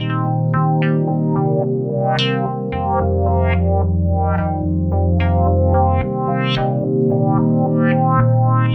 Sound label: Melody, IDM